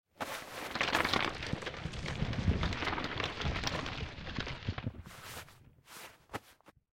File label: Flat Rock Slide